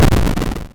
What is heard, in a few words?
explo